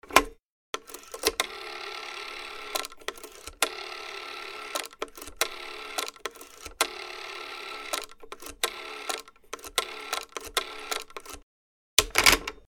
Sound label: mechanical dialing